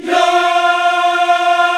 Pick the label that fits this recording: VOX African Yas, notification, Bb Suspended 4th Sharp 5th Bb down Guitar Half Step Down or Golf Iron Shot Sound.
VOX African Yas